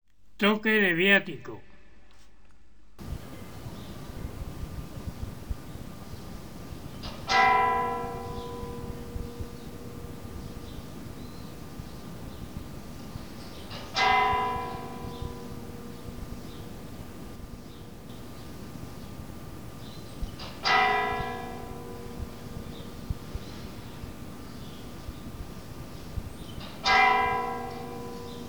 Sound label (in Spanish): Toque de Viatico, Campanas; Toques de Campana en Sangüesa